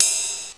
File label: DP50 Ride, Technics PCM DP50